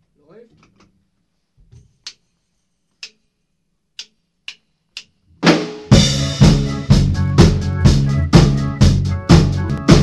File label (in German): Gangster mit Gesang